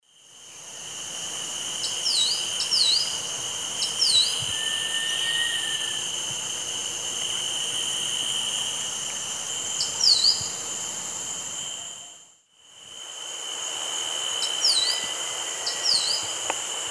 Caprimulgus maculicaudus, song; S. America; Eastern Lowlands

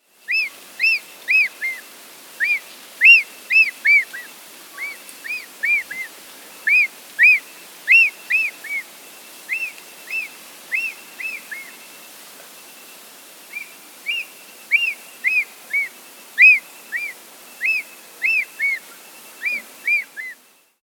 Ornate Hawk-Eagle (Spizaetus ornatus); Brazil; S. America

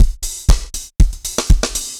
dancefloor tight 120

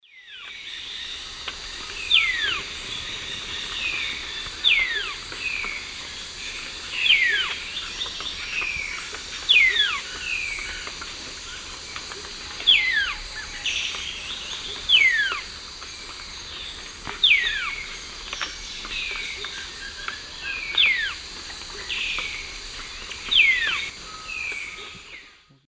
Cymbilaimus lineatus, call; S. America; Eastern Lowlands

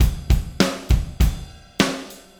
Rock Steady, Rock Drums

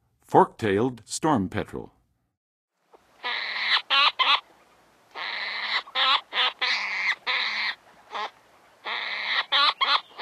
Fork-tailed Storm-petrel; North America; Western USA; Western USA Songbirds

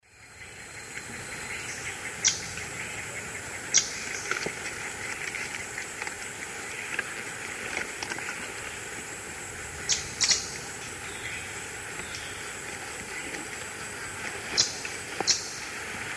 Schistocichla leucostigma, alarm call; S. America; Eastern Lowlands

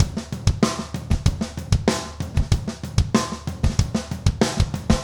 rock toms 90

